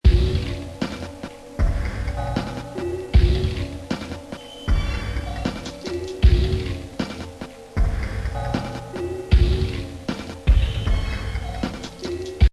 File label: loop